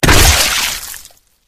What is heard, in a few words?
bodyfall combat form; flood